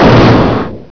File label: step